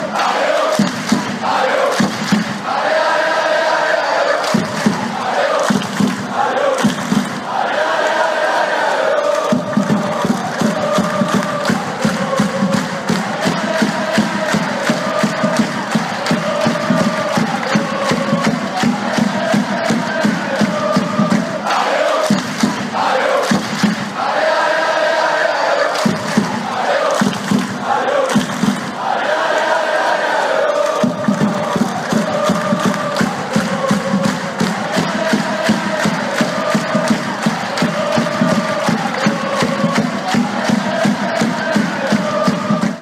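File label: pisa chants, football songs and soccer chants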